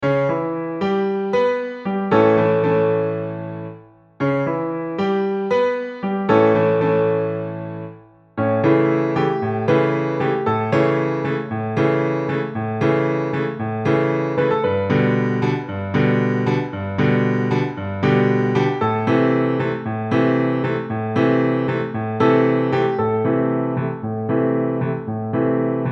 solo, piano

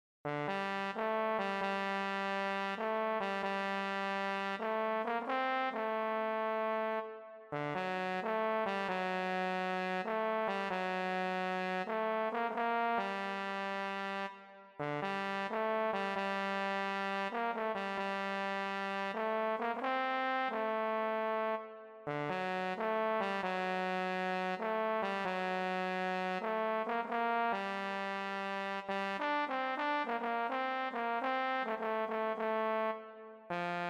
euphonium

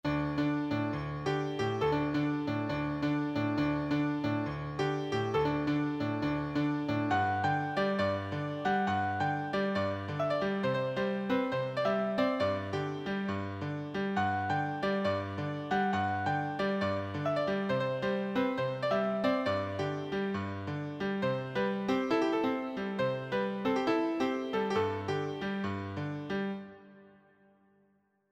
solo, piano

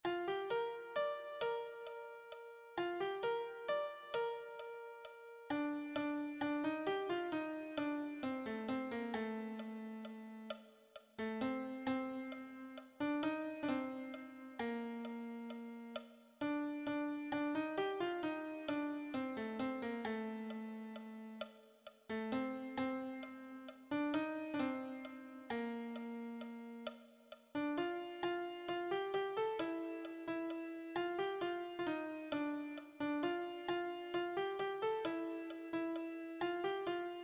melody

